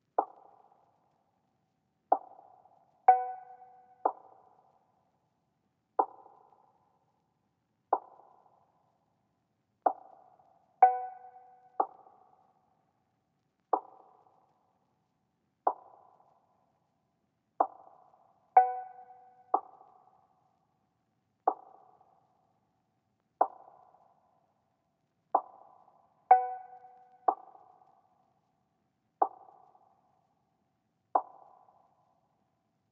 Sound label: 124 BPM E Min Drums; Cymatics; 2022 Melody USB Expansion; Heaven